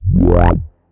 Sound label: bass stab